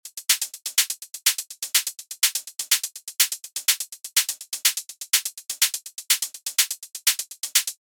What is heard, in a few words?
Hat, gentle hats hi hats and shakers; basic wavez; gentle hats vol 2